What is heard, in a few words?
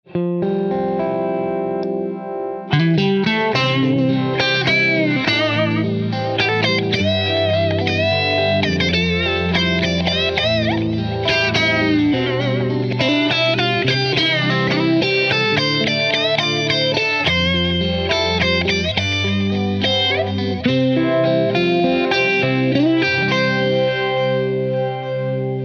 MXR Phase 45, Strat, Phase45, Randall Plexi, Stereo Delay On Right Side; EJ Strat